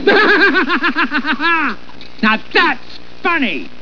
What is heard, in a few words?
funny